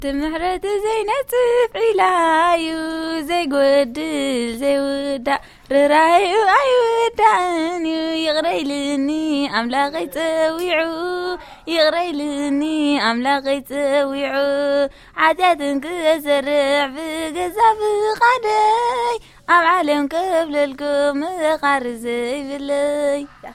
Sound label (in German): Gesang, Schulen